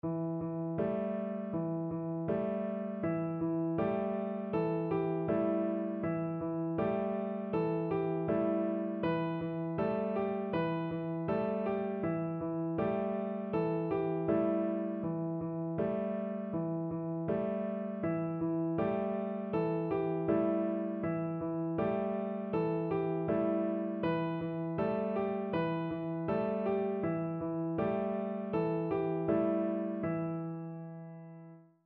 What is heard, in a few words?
yangtze boatmen, piano